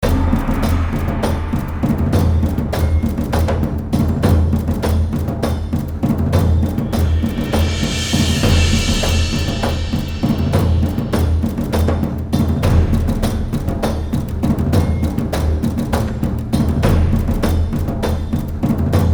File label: War Drums of the Far East Percussion Only